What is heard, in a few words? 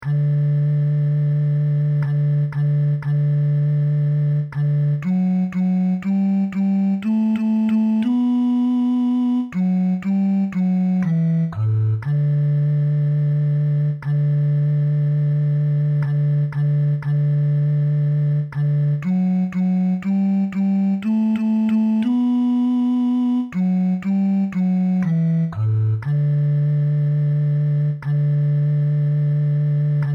Lamb of God Bass; Holy Cross